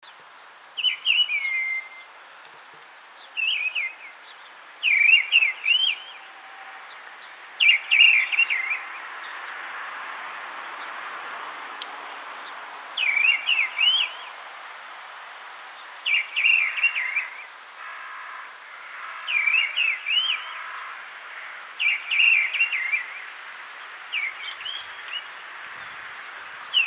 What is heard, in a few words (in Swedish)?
Dubbeltrast; Mistle Thrush; Turdus viscivorus; Stuart Fisher